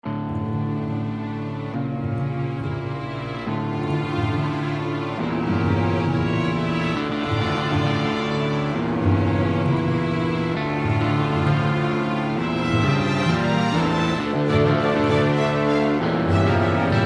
CINEMATIC ACTION

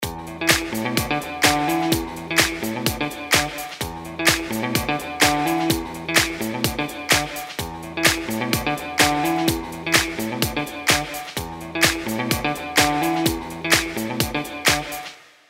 HONKYTONK FUNK

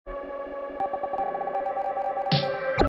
NEBULAR

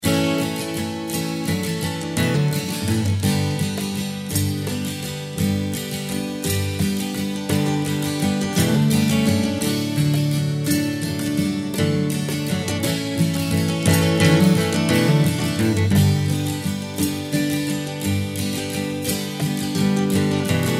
AMBIENCE POSITIVE

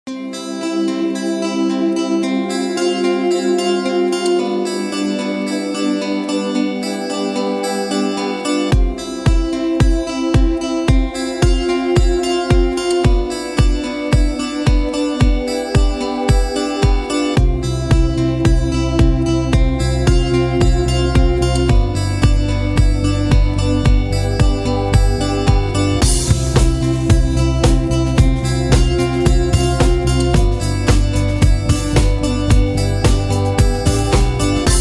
GUITARING

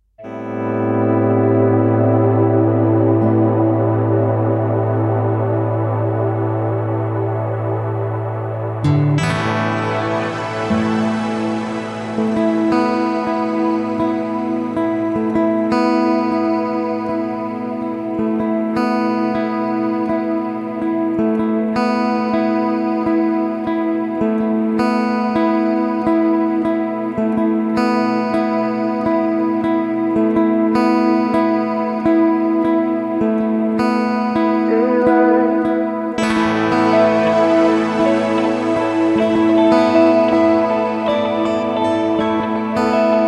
HARMONICS

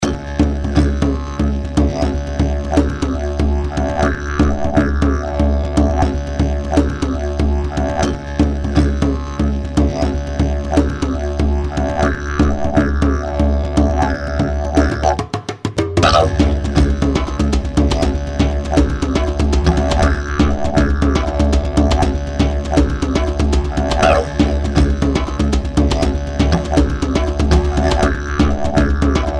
DIDGERIDOO

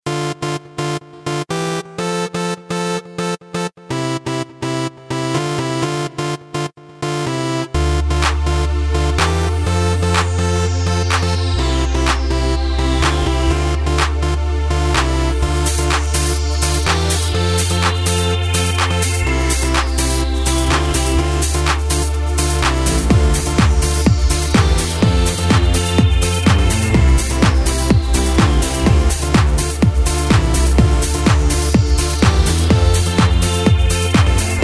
ELECTRO

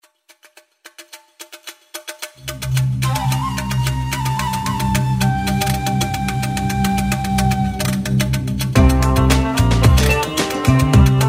AMBIANCE KURDISTAN

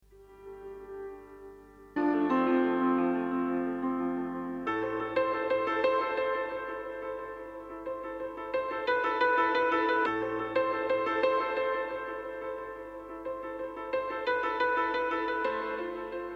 PIANO

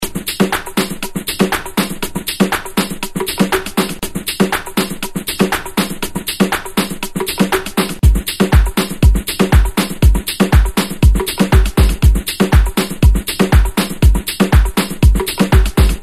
BRAZIL ELECTRO

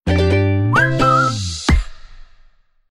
jingle whistle

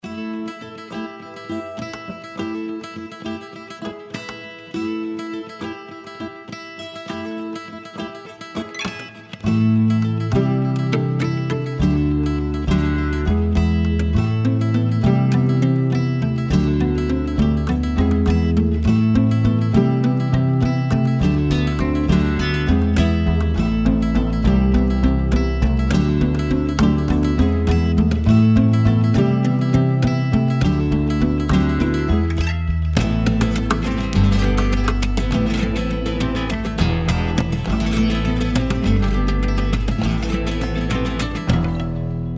GUITAR

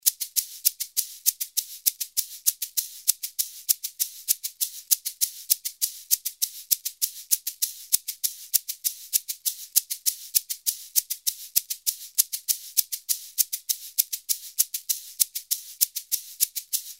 SHAKER

